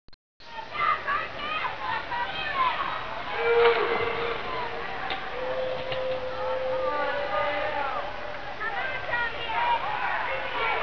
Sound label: dodgeball crowd